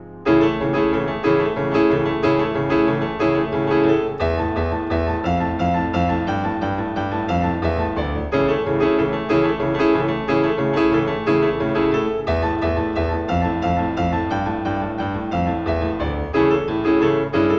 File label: etude for piano; nonesuch